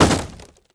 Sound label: door impact wood; Rust